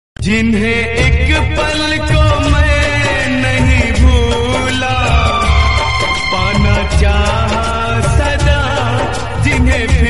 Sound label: hindi, sad